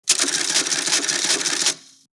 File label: Cash register adding cash